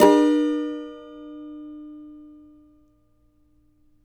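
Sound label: A minor, Guitars; Spectrasonics; Hans Zimmer Guitars VOL-2; CAVA CHORDS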